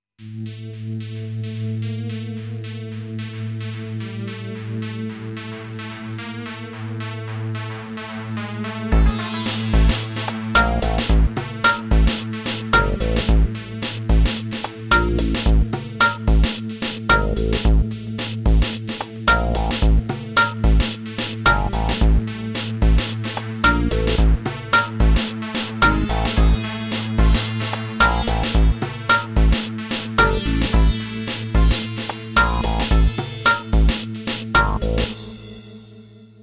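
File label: groove; sy85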